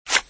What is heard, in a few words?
Send Card